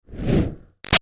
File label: Fire Torch Whoosh Med Light; Flames; Sound Effects:Objects; Big Room Sound